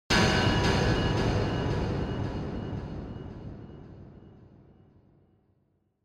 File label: Impact Delay; Onoma